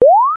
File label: green, Simon Game